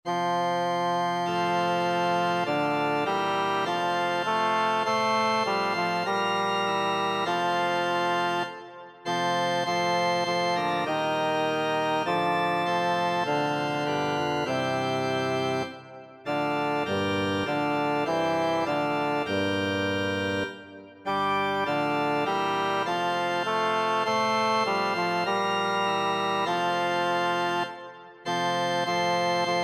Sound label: bass; isaac heinrich; insbruch ich muss dich lassen